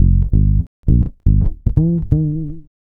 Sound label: Electric Bass; Basses